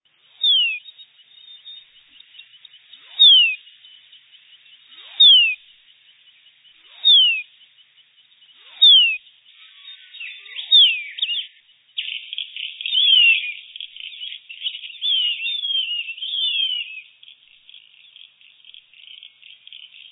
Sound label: horsefields Cuckoo; horsfields bronze cuckoo; canberra garden birds